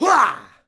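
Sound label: Axeman Attack Vox; Civ4 warlords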